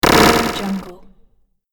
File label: robotic circuit break